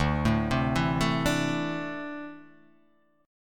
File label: D 7th Suspended 2nd down, chord; D7sus2 arpeggiated